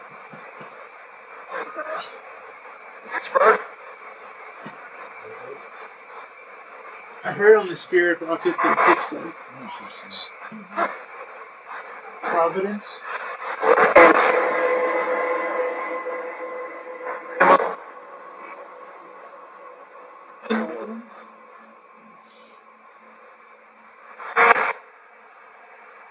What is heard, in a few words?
Library Spirit Box